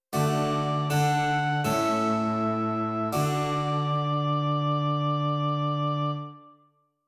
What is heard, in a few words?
continuo, dictation